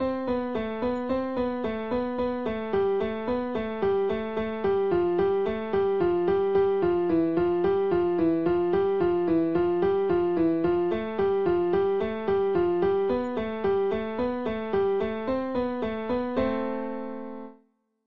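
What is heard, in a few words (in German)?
Fingerkraft Übung, klavier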